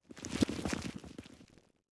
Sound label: Passby Debris